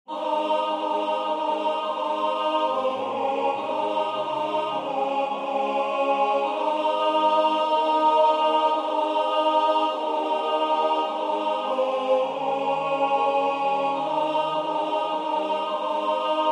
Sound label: lead kindly light A; newman